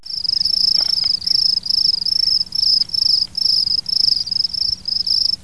cricket

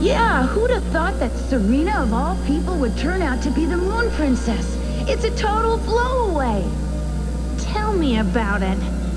blow away; "Who would have thought that Serena of all people would turn out to be the Moon Princess? It's a total blowaway!"

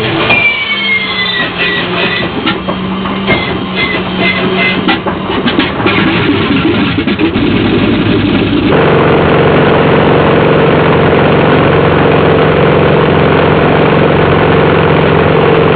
CHS Radial

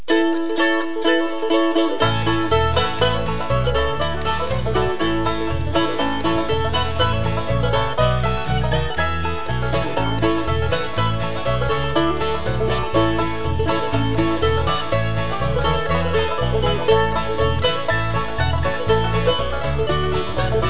walkin

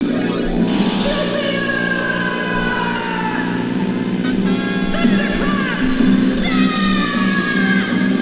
thunderclap; Jupiter Thunder Clap Zap!

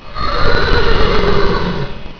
NEIGH

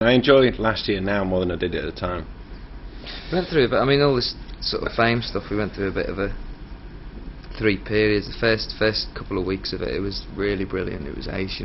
boo

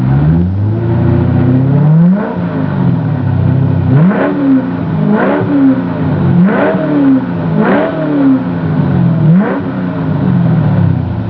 exhaust

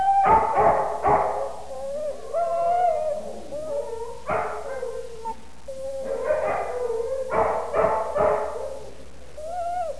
dog

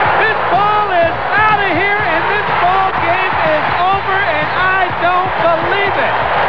game over

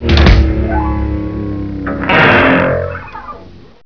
drums piano guitar

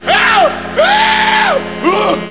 screams

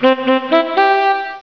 notify

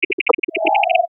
insect

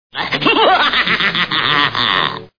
muhaha

